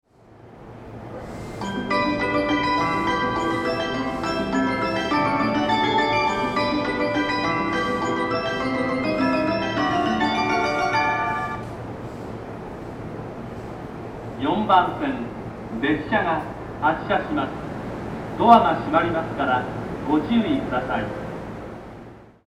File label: minamifunabashi melody; jreast